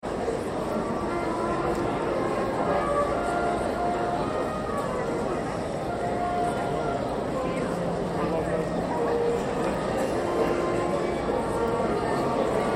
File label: Crowd High School Grad Idle Background Band; Auditorium; Chatter; Crowds; Backgrounds